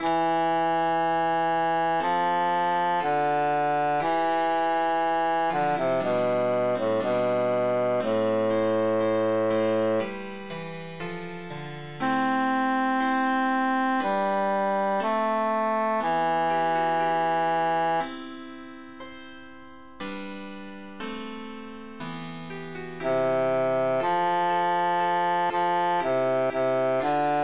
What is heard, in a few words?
bassus emphasized; mille regretz